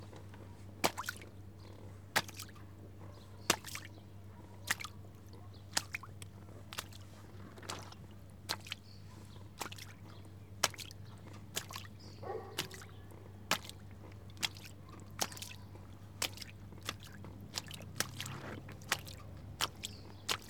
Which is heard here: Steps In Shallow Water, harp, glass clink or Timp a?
Steps In Shallow Water